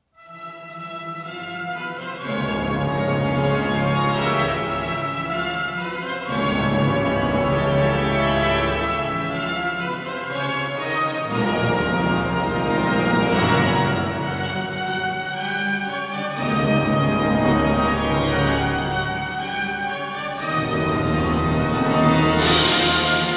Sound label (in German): Konzert für Orgel und Orchester Finale; Eben